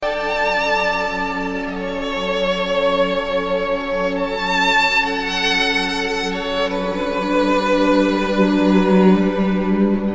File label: lullaby; bioshock 2